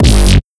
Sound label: Kick Dist Bass FX 1; Granular Sumo